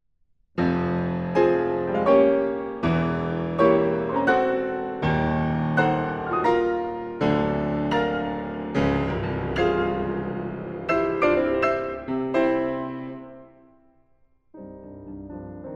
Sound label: Piano Sonata in F Minor